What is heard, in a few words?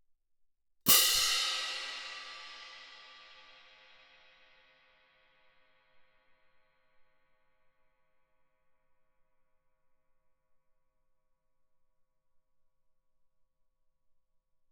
17 orch crash, med heavy, ff, crash cymbals; Percussion